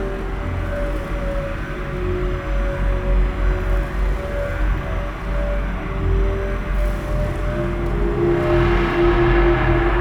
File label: dyson sphere treble hum; Stellaris; utopia